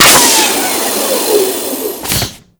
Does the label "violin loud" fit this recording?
No